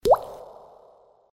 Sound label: waterdrop ringtone, sms